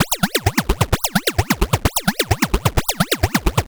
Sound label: Android Arps 130BPM